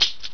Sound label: key